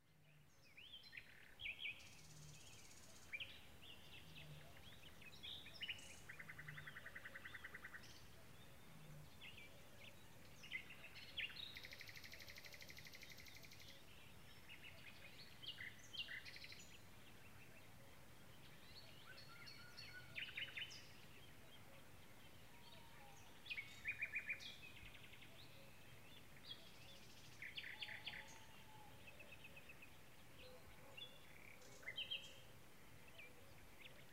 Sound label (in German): nacht birds